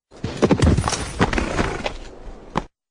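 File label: horse fell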